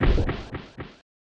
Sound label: Death Blow